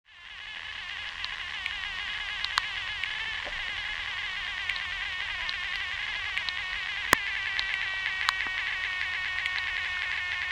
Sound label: hogganfield pond hydrophone recording